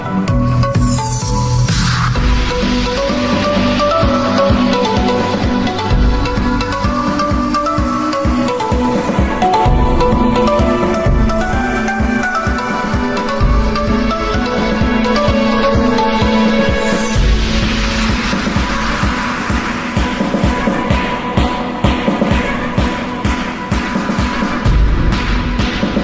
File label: sweet music ringtone, bollywood ringtones